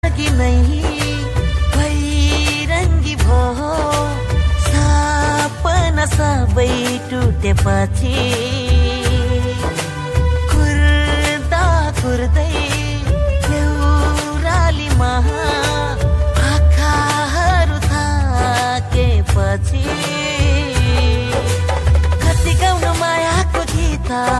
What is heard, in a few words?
nepali ringtone